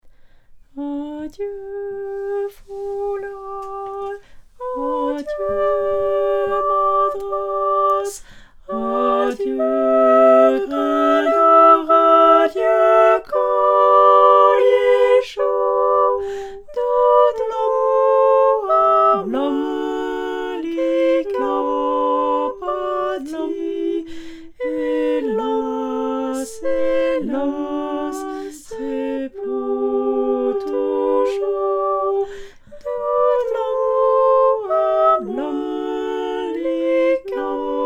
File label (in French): Tutti; Adieu Foulard